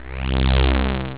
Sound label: zap; xfce